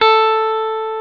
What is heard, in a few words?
Elec. Guitar A3; Multisamples; Proteus 2000; Digital Sound Factory Proteus 2000